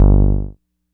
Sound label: Moog Bass; Basses; One Shots; Electronic Point Blank